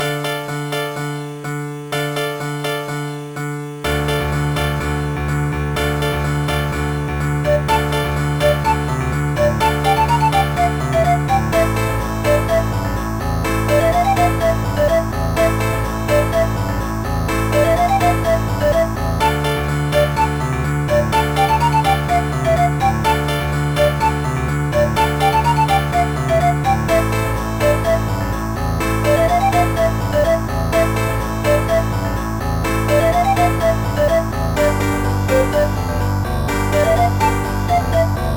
mod acoustic; Trackers; Modland

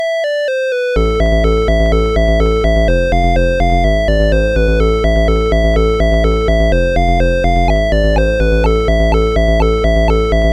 airplane; MusicLine

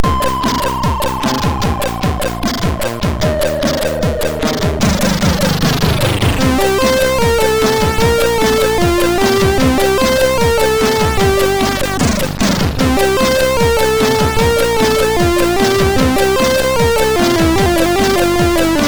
sid MOS6581R2

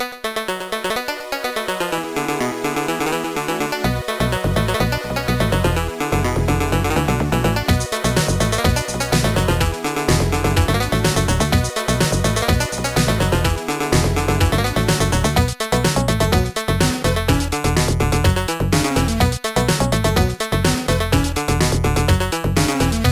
jazz funk; Genius; Trackers; Modland

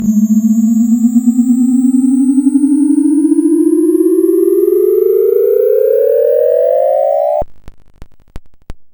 teleport; zak; SCUMM

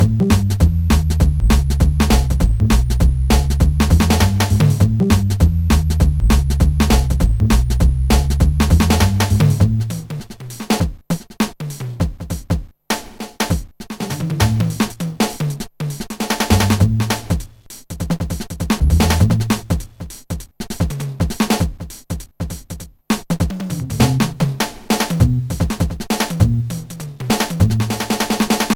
drumsolo; Trackers; Modland

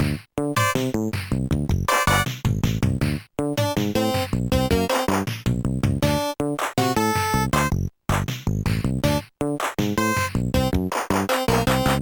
instant music; Deluxe Music Construction Set

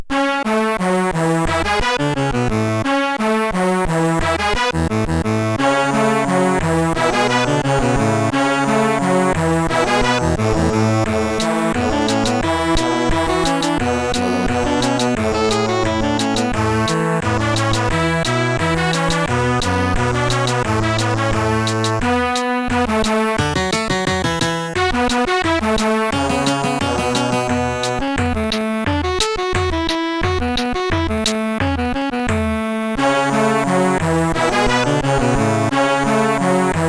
cancan; SoundPlayer; Lemmings

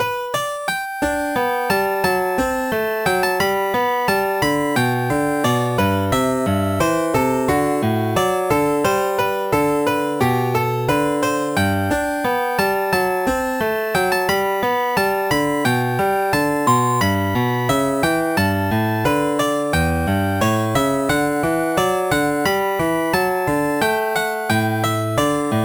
bouree; Sonix Music Driver

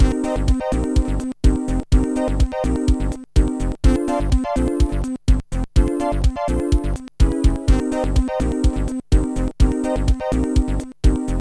funky jazz; Trackers; Modland

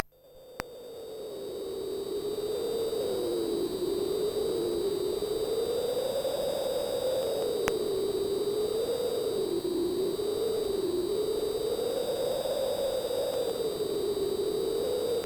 wind, Trackers; Rogue Male; Trackers Modland

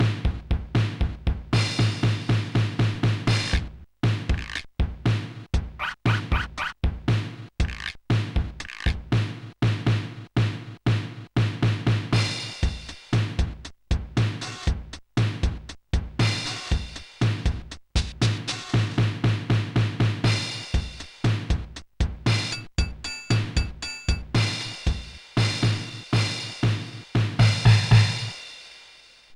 Kit One, Sonic Drum Kit

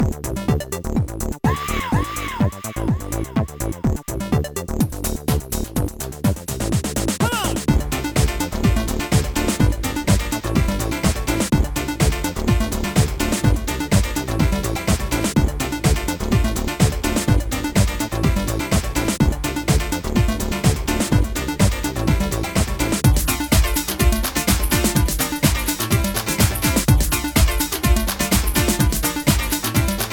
dance stereo attack

